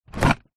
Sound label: vehicles scooter moped seat lift up open